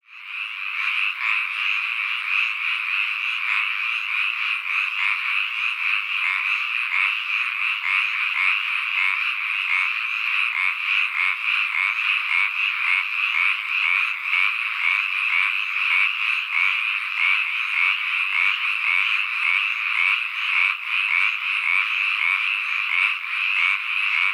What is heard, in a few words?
hyla japonica cry